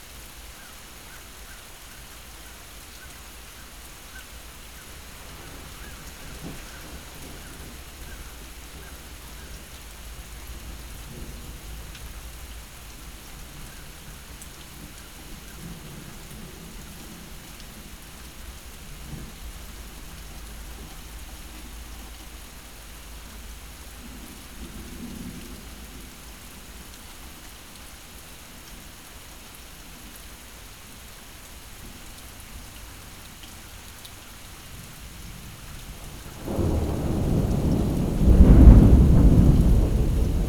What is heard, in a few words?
Thunderstorm